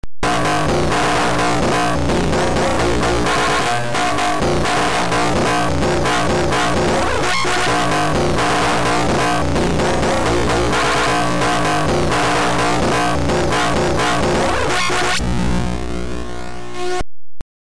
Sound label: guitar; lsdj